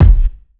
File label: small reverb kick F# key; kick drum; F sharp